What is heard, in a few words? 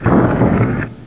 door, CHASM